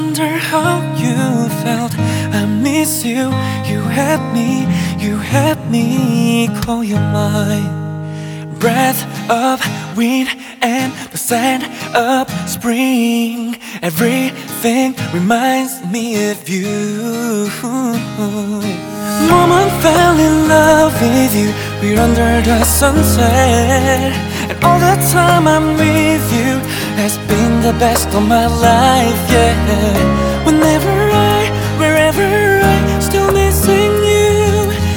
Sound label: Male Version